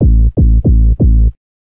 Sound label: Sub Bass A minor 80 bpm; MIDI Clips; Bassline; New Old; Ableton; Factory Packs; Chop and Swing